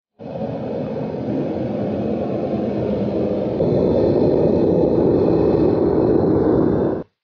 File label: Furnace; کوره